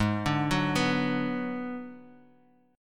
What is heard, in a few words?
Ab Minor 7th Flat 5th, Bass Standard; arpeggiated; 16,17,16,16; JGuitar